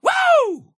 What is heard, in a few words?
woo